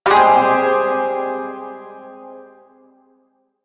Shawzin Min Chord K, Music; Chords

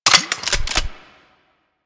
Double Barrel Shotgun Fire Mech; Weapons

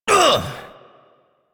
Injured Grunt; Warframe; Tutorial; Injured Ostrons

